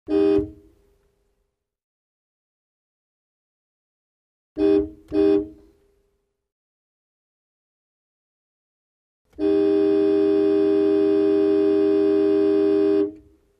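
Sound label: Car horn sound, klaxon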